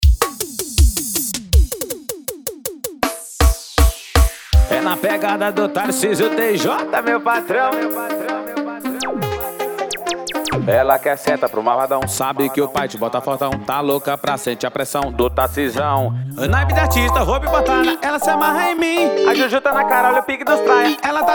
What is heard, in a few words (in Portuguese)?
piseiro